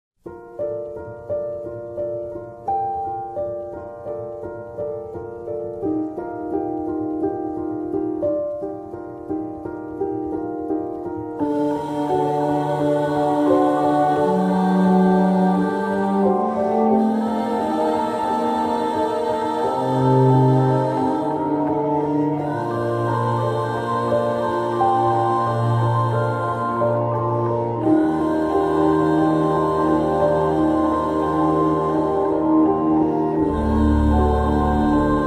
Music

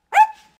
bark; darby; video game

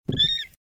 Meow

